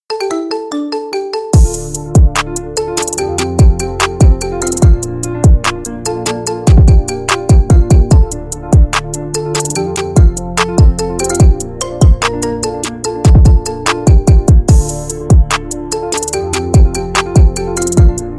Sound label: marimba remix rington